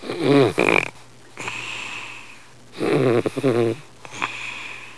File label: MALE SNOR